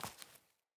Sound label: Grunt Boot Walk Veg, Footsteps, Boots; Enemy; Sinecure; Melee Grunt